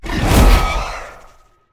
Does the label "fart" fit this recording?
No